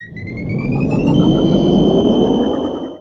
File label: photon cannon; starcraft; protoss